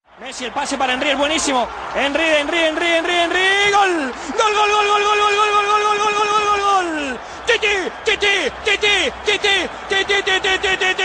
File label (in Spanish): espanol tono de llamada